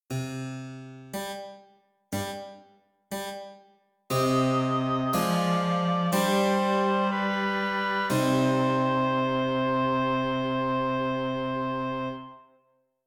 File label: Continuo